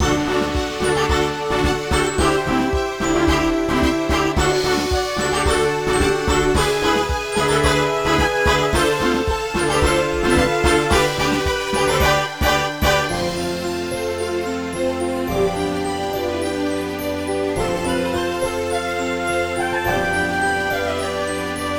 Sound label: Lobby; Cookie Run: OvenBreak